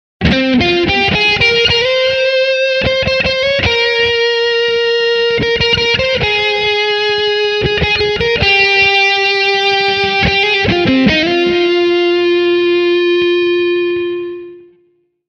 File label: lead; es335